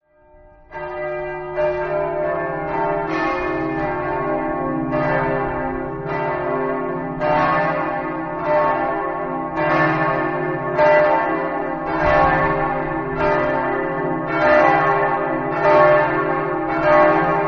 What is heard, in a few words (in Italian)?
cividale, campane